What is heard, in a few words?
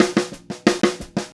090 Chill Pop Drums Fill; Pop Rock